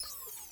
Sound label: comp disconnect